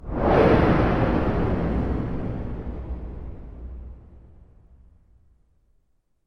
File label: Woosh